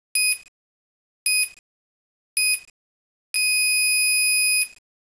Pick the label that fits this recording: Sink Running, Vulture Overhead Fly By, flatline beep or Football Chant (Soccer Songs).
flatline beep